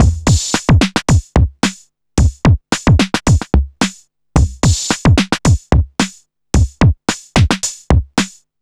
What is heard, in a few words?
junk hip hop 110bpm